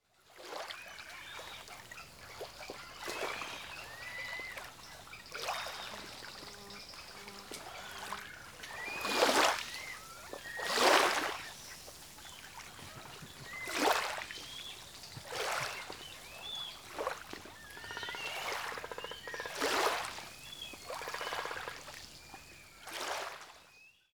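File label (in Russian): звук летнего пляжа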